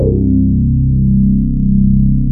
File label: CYBER BASS; Rubber Chicken Software Sample Bank; DRUMS + PERC